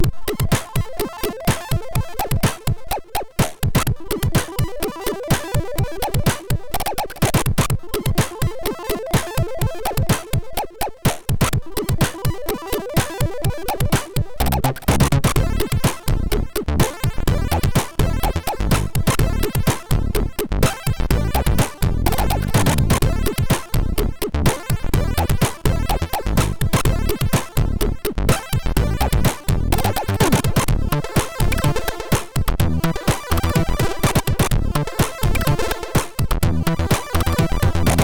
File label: Speed Tune, sid MOS6581R2